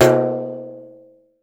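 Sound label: LARGE BRAZIL, PANDEIRO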